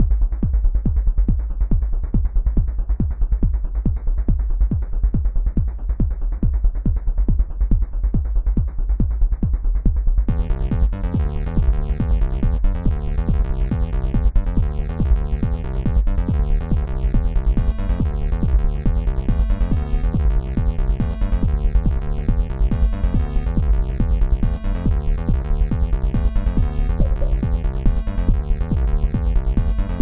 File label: final Battle; Seasons-Of-Loneliness